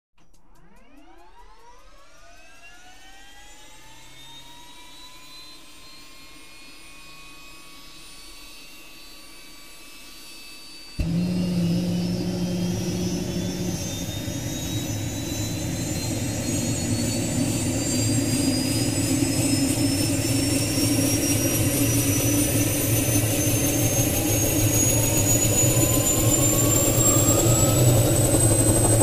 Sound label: Hughes 500 Start idle